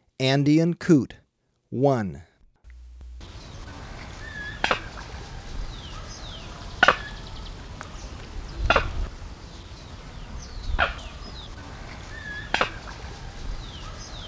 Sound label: Andean Coot; S. America